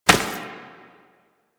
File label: Step Dirt, Foley, Footsteps; Enemies; Warframe; Duviri; Dax